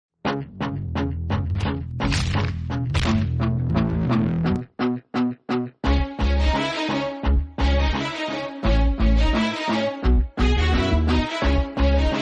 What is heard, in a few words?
nadaswaram traditional wedding music instrumental